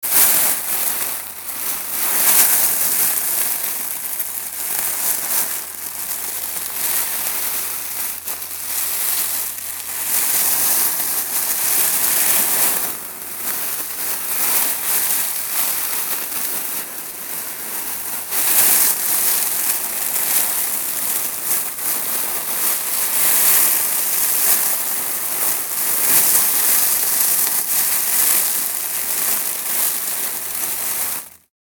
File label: indoor